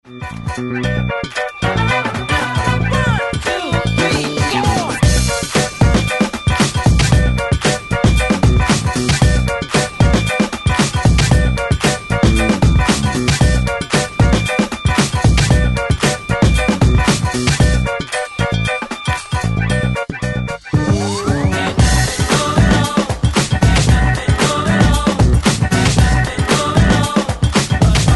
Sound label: Funky Song